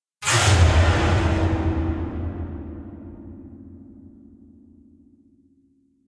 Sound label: piano notes; SyrinScape; Madness in the library